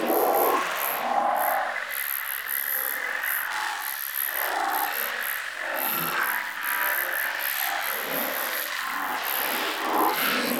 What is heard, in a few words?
Ballas Eye Glow Loop; Warframe; Chimera Prologue